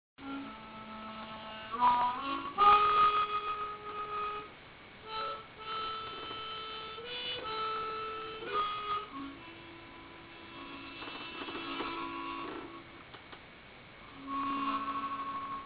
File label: harmonica